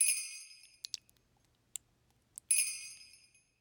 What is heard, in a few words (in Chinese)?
鬼差出场